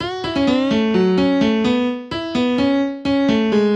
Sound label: piano; grade1; nepali; shabda ra chitra